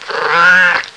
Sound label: cat growling; pets; Sound Effects for Multimedia - Volume 1